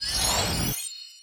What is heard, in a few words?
skill learn hold